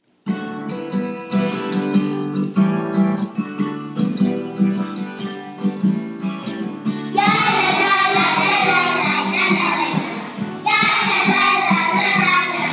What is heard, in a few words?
Mi Chamocha Jr Choir; Mi Chamocha Featuring the Junior Choir; House of Worship